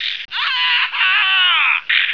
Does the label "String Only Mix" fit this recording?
No